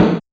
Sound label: Kit Snare, Realistic Concertmate-670; One Shot Kit